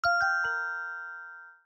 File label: notifications; amiable; OnePlus 5T Stock Ringtones